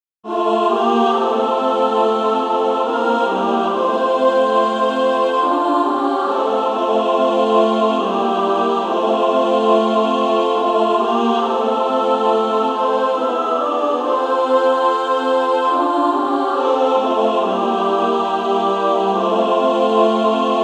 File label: Female Choir